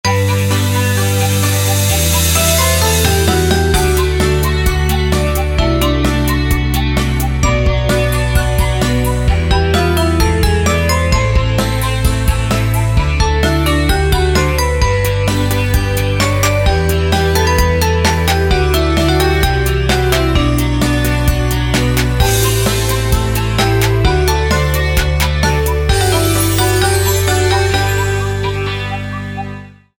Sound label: chime